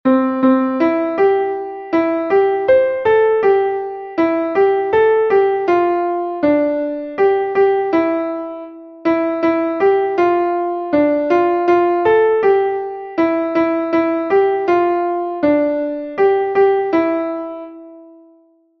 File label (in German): klavier; MelodieMeister